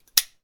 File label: Knife Flick